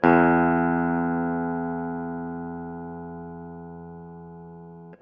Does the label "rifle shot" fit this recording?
No